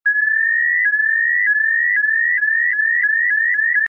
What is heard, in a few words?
high pitch alarm increasing freq